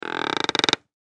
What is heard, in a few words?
Drum Tune, Drum Tune Turn Key Squeak; Drum Tune Turn Key Tension Squeak; Drum Kits; Blastwave